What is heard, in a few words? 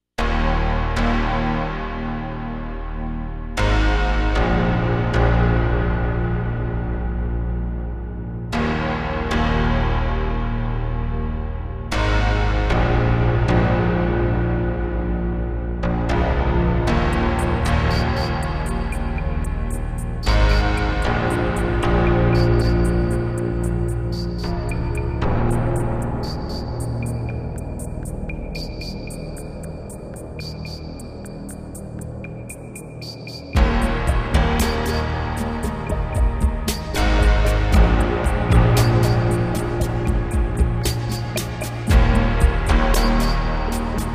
Ambient